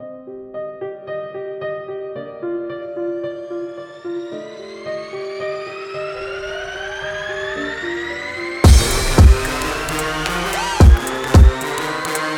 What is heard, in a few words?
Action